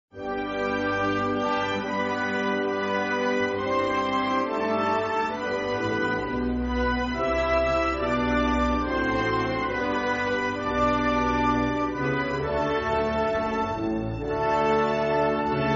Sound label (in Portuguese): hino de Tonga